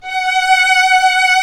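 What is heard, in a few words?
strings, 8pc; String Master Series; Vlns 2-5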